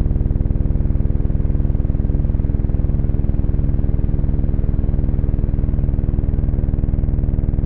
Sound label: reconstruction amp Mod Model